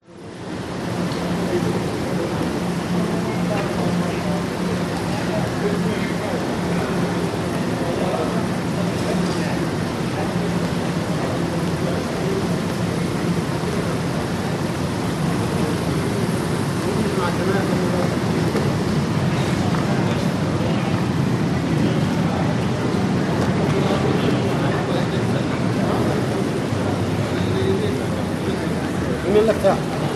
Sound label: Qatar Airport Hallway Terminal